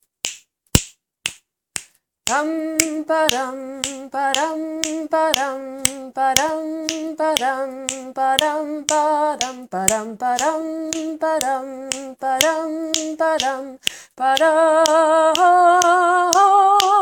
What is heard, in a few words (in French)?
basse; Archers